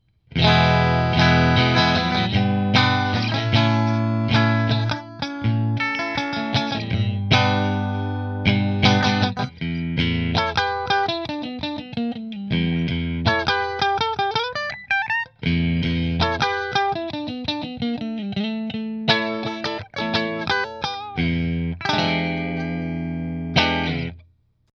Nola Voodoo Driftwood Bridge Middle Fender

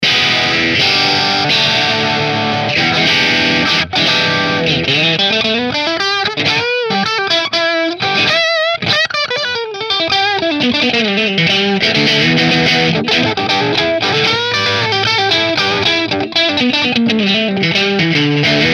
Bridge Middle Marshall; New Orleans Guitars Voodoo Custom Quilt Top

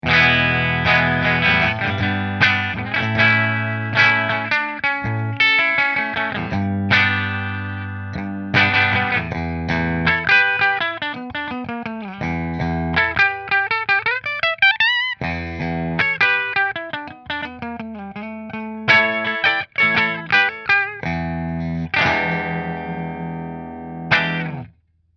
McNaught Bridge Fender, guitars; McNaught Custom Guitars Vintage Single Cut Black Back; Orange

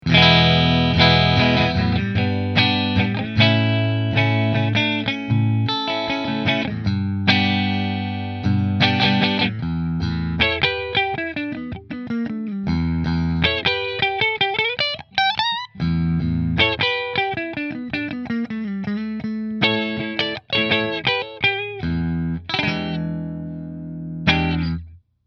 Nola Voodoo Redwood Middle Fender; New Orleans Guitars Voodoo Custom Redwood Top

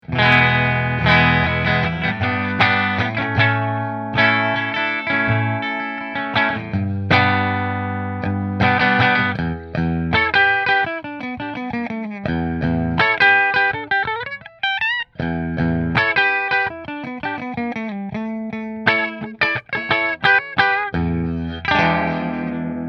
Rahan Position 3 Fender, guitars; Amber Burst

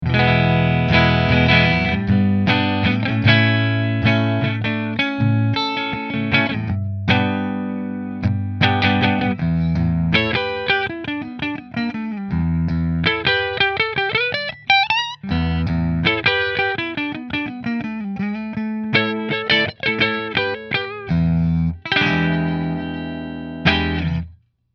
Nola Voodoo Redwood Neck Fender, guitars; New Orleans Guitars Voodoo Custom Redwood Top

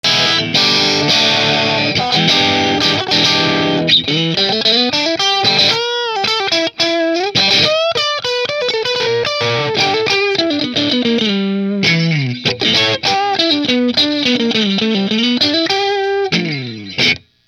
p90 driftwood middle marshall; Nola Voodoo 3

